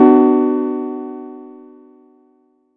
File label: Chord; ModelCycles